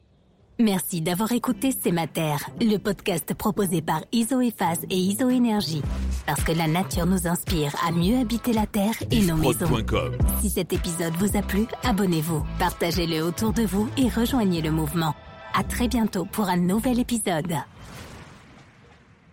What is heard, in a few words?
Podcast Outro; C ma terre